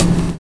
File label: LOW TOM O; Univers Sons - Kitch 70s